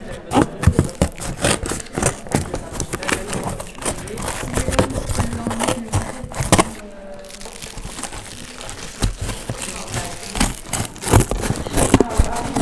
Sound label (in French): Decoupe de carton, asmr